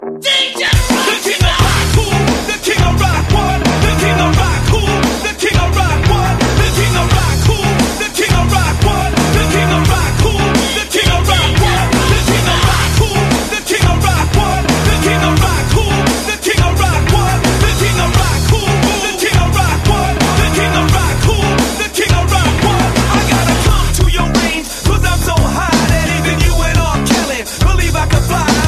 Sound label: rap; hip hop